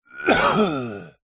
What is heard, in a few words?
human male middle aged cough exaggerated moan